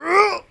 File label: Player Grunt